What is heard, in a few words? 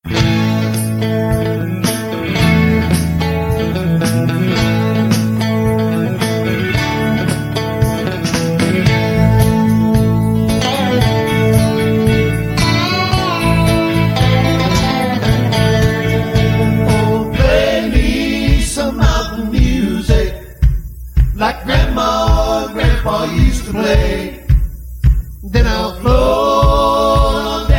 Mountain music